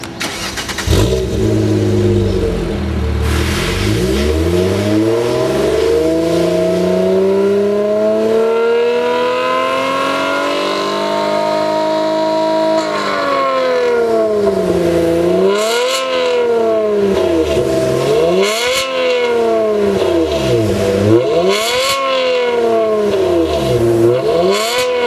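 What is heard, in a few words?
muffler; GT; unicorse-italial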